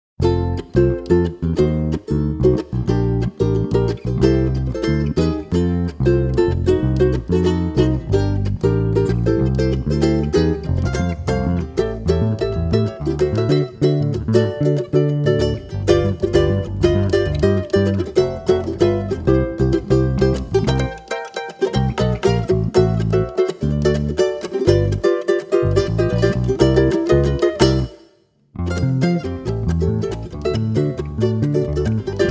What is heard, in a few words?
ragtime